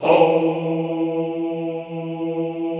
HOUUU, Classical Choir; Best Service; Extended Classical Choir

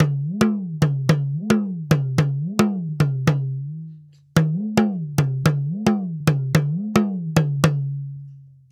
Talking Drum, African; Sonic Foundry (Sony Creative Software); Continental Drift Aftershocks

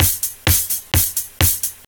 Straight Loop 128 BPM, House

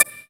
Synth Sound; VEE Synth Shots

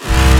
Basses Root C

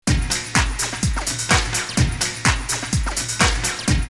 Latin Sam-bah 126, drumloops Rave; X-Static Goldmine; 90 s Sample CDs

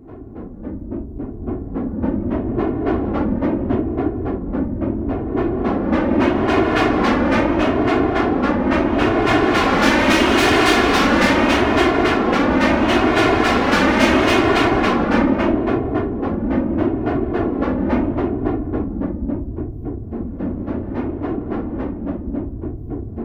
phase train; Zero-G Ambient 1; PHASY TRAIN

